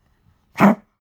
pug gag smack short; natur-tiere; hunde

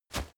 step leaves wet sprint single; schritte

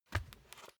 step barefoot wood plank sneak single left; Footsteps; schritte

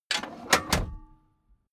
van back seat turn forward; verkehr transport; autos

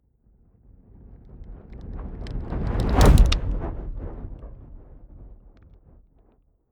fire whoosh crackling long

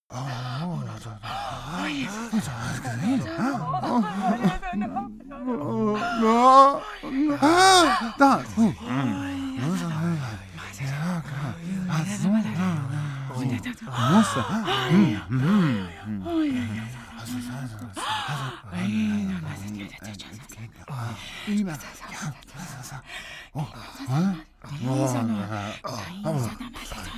crowd scared walla

